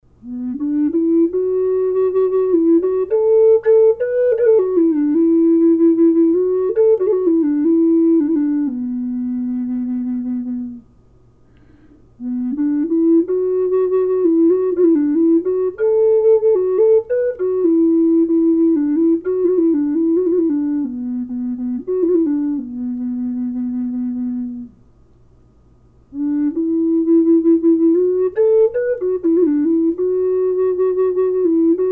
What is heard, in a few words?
low B sea turtle, flutes; collector flutes